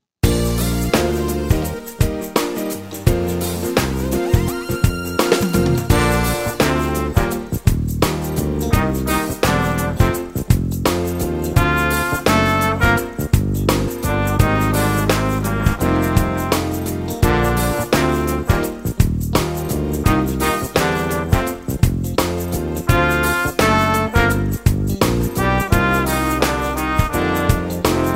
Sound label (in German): trompetentaxi